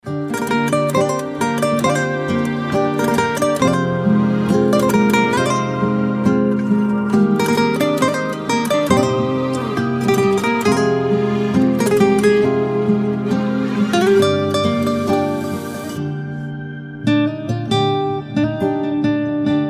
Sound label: Guitar